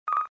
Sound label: disconnect